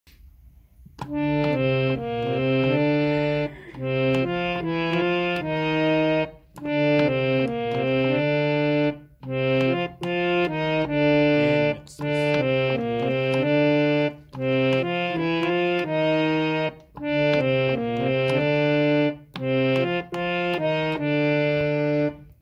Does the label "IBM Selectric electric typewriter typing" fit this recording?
No